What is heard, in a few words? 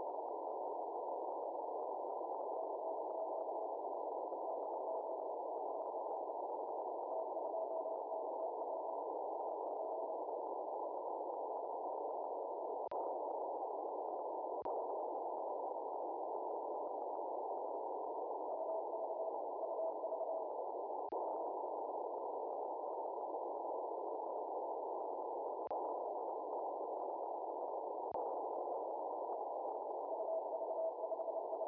3.5MHz